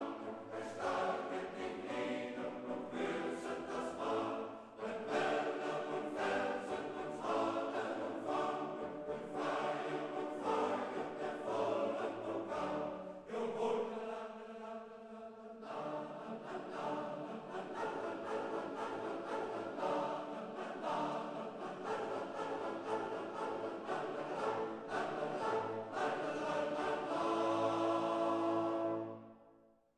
Koor mannen Jagerskoor; Mastreechter Staar; uit Freischutz

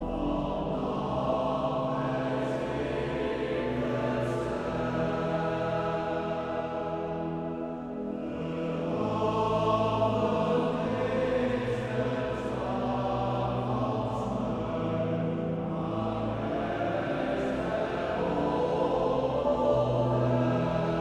Koor (mannen); Urker

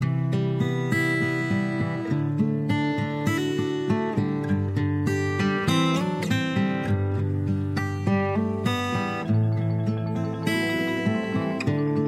Instrumentaal

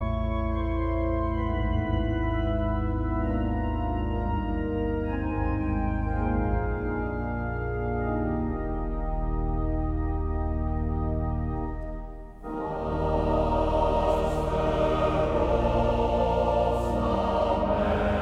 Koor (mannen); Westlands

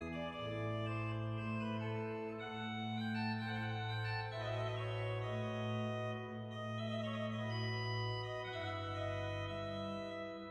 Adagio (orgel)

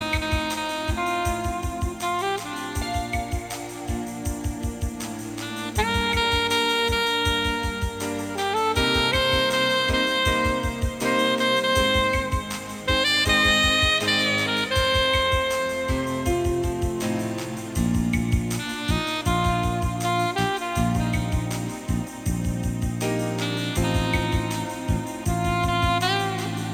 Instrumentaal

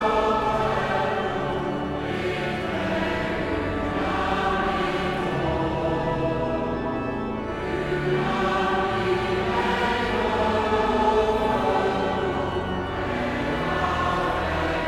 Koor gemengd

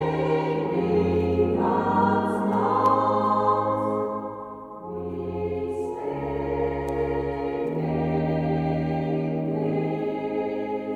Koor dames; Schevenings vissersvrouwen

